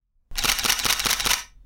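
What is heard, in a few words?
Nikon D5200 Shutter Release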